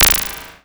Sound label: DSP Hit; Sonic Foundry (Sony Creative Software); Twine-IDM